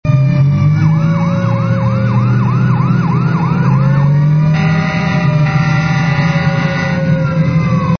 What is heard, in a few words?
firetruck